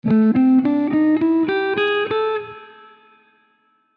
Bloodwood wooden pick; SixStringers

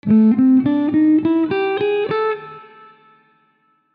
Jarrah pick; Plumstone Guitar Picks

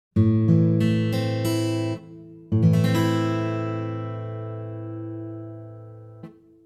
A Minor Basic Guitar Chord

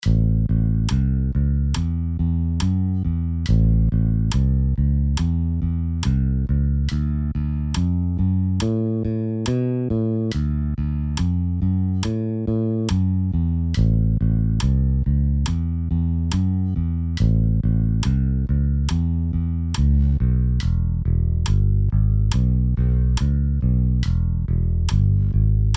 Bass Tabs Exercise

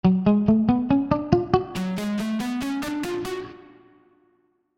Clean vs Distortion guitar using Boss DS 1

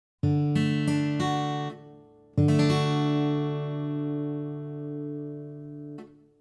D Major Basic Guitar Chord

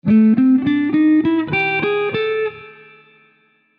Purpleheart pick; Tree Picks

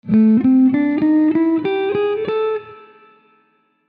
Mountain Ash pick; Plumstone Guitar Picks

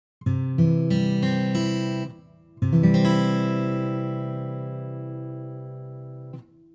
C Major Basic Guitar Chord

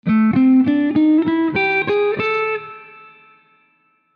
Zebrawood pick; Tree Picks

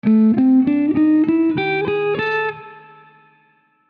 Padauk wooden pick; SixStringers

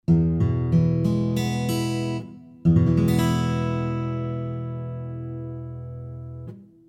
E Major Basic Guitar Chord

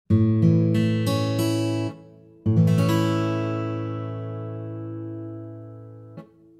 A Major Basic Guitar Chord

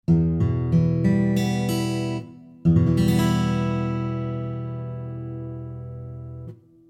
E Minor Basic Guitar Chord

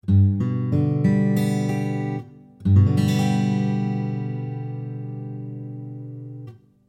G Major Basic Guitar Chord

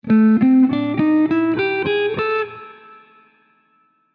Maple pick; Tree Picks

